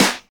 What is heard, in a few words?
original snare one shot g key, snare tuned to the G note